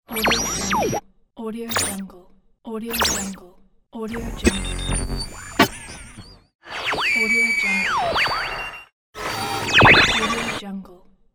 radio tuning

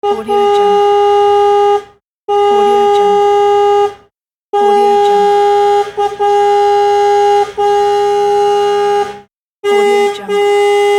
fire truck horn pack

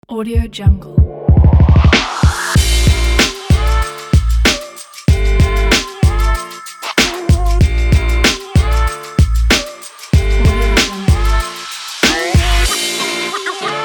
vlog travel summer energetic upbeat hiphop

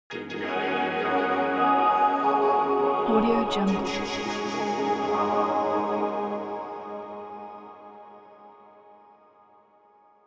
musical vocoder vocoder vocals

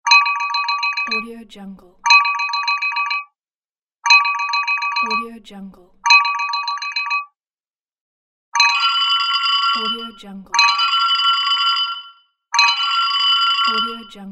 phone ringtones; volkovsound

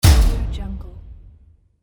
bump

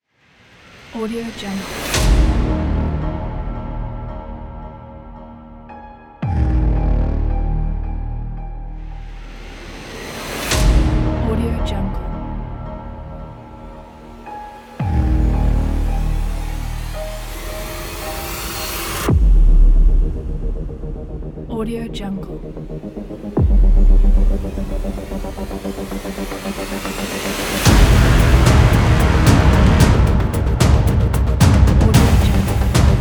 action trailer teaser; hardprosound

